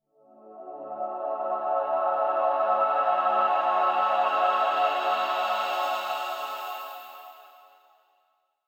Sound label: Pad; Dubstep